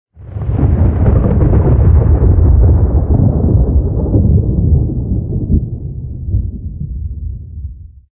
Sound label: rolling thunder; video games; ambient; Source Engine; tf; lair